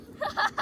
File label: Laugh